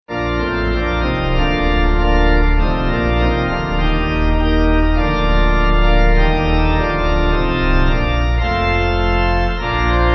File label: Pipe